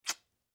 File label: Kisses; Quick Peck With Light Lip Smack